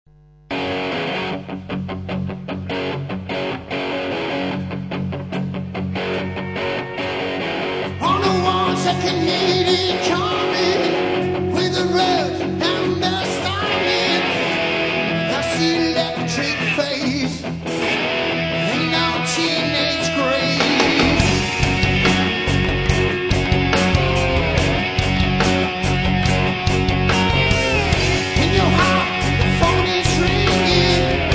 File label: electric phase